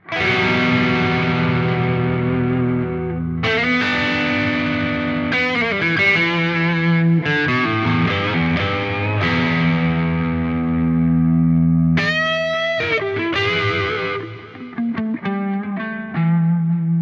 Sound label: Zoom G2X FOUR Wide Crunch